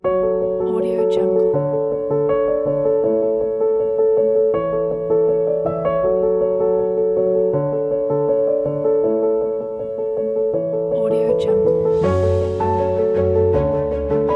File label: contemplative and pensive